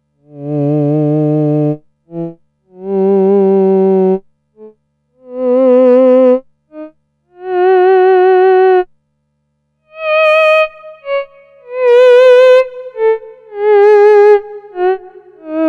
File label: theremin